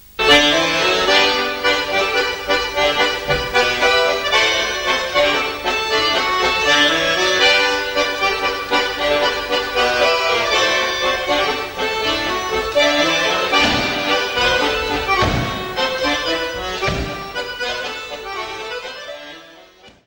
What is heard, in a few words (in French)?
Wals Mazurka - Belgique